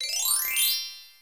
level complete

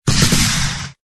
rocket; game

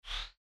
slide

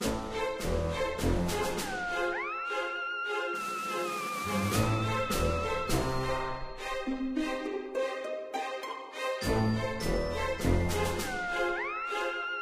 menu

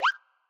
jump (without delay)

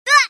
behit; game

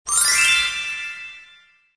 bell; jellyslice

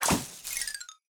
pinata crack; dapopinata

